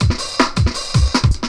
160, Drum&Bass Classics Breaks; EZRollers

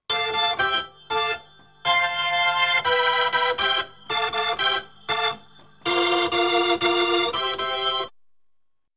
B3 Organ; Masterbits CLIMAX 8

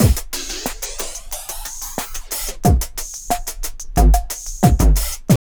TRIP 90, trip loop

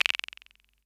SR95; Best Service; Dance Mega Drums

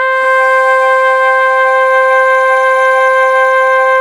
SAXY C4

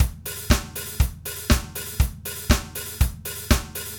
Basic Acoustic Drums, drumloops; Dr. Fink's Funk Factory

